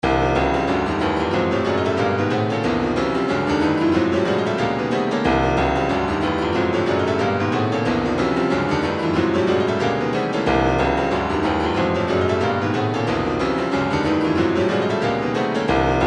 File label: retro funk 092, midi; funkiteer